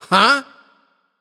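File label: confused, nonverbal; smite; voicepack; khepri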